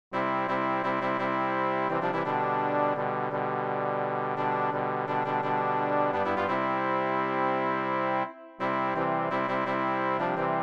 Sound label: midi